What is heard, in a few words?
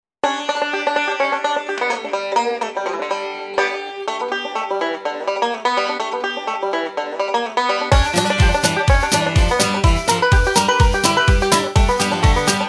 BANJO BAND